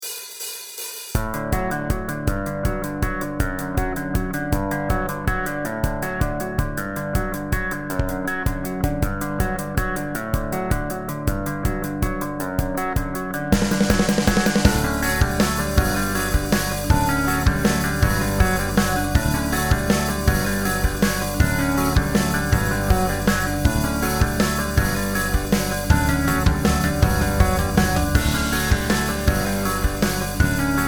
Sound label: upbeat punk pop rock song